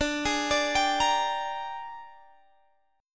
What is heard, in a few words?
A sharp 13th